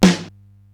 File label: SDS9 SN, Simmons SDS-9, Snares